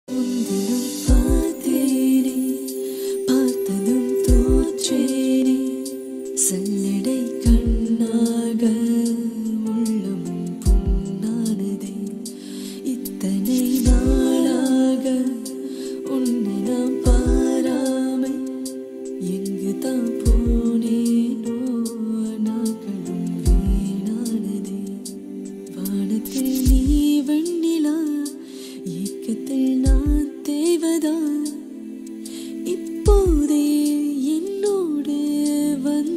song bgm love melody ringtone tamil love bgm music